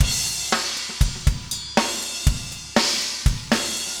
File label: funk, 120bpm, loops